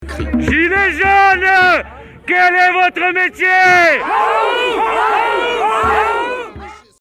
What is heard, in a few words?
ahou